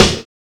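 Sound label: vulgar snare; Drums In Your FACE!; 90 s Sample CDs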